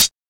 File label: sharp pedal hi hat single hit D key; hat samples